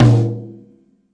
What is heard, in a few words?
pearl kit hi tom; REAL LIVE KITS